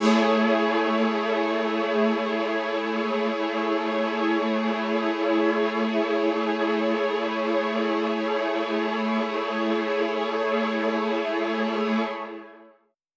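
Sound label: Poly String A major 7, synth orchestra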